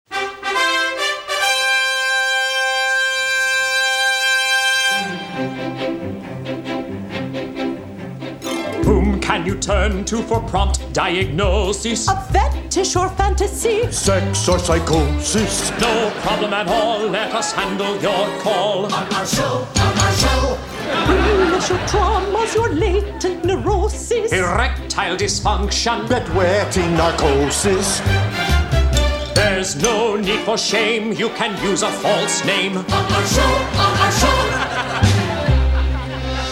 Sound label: radio jingle; Frasier